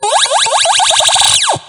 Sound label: Sms Ringtone; Vivo S20